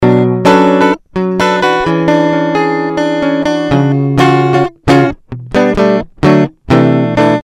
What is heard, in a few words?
clean riff 130bpm